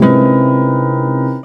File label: VindTunnel; Total Drum&Bass; Weirdos